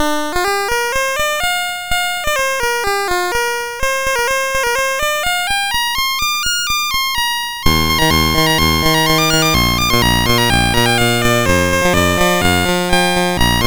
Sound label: sid MOS6581R2, GAMES; Changs Adventure